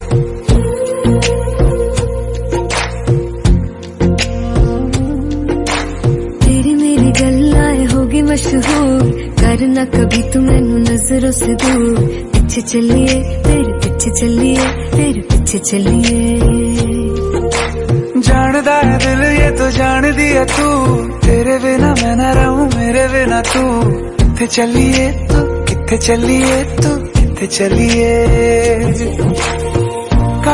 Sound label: Female Version Ringtone